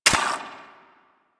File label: Braton Prime Fire End; Warframe